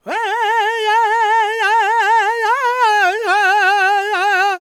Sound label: NA G# MIN, Vocal Planet World Voices LAKOTA